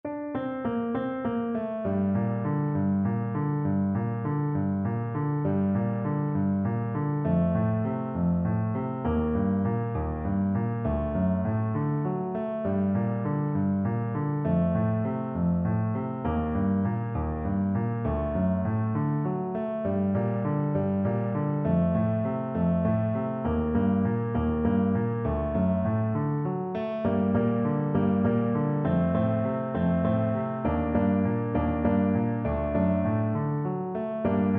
spanish violin, Trombone

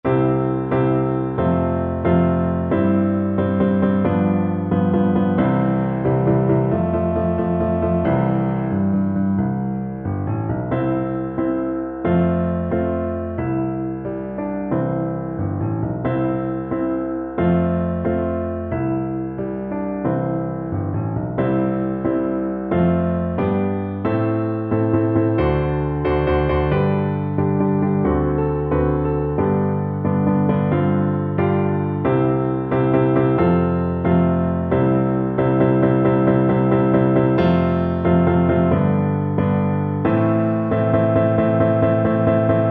we shall overcome, Flute

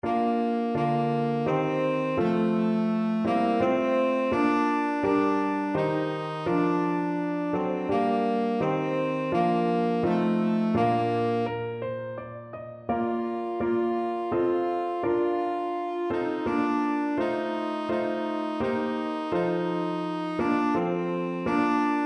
tenor saxophone

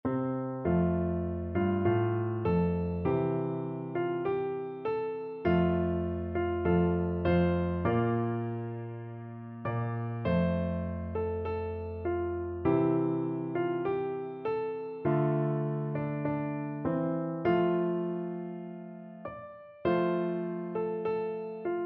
auld lang syne, piano duet

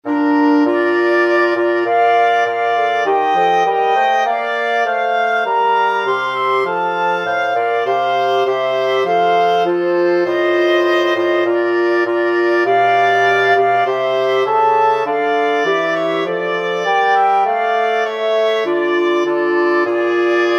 navy hymn brass, wind quartet

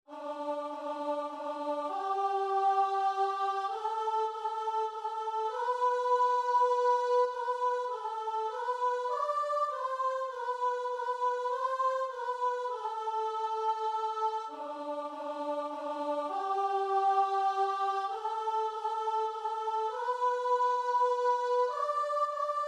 come holy ghost, guitar and vocal

